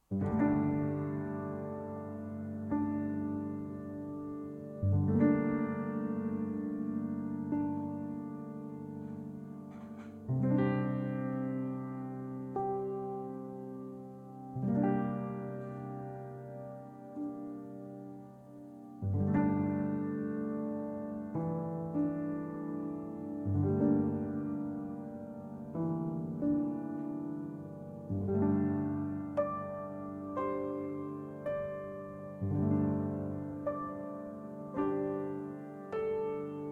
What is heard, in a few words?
music summer heat